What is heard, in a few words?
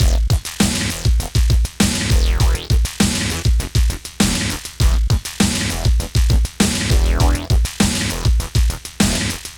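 Pop Electric Combo, Emaj, 100 bpm; Digidesign; Pro Tools; Pro Tools Essential Loops; Pop Rock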